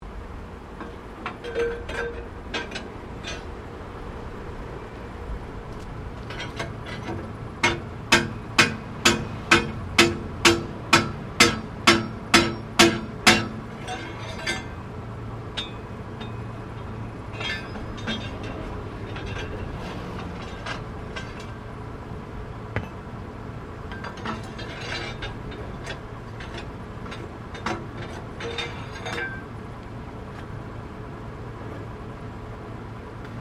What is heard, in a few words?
Cement pump manual cleaning, Industrial cement pump; Construction Vehicles